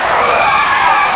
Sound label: ski; NewSurfs; PC Action 1998 December